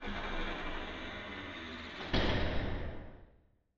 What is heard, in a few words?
door; musicbox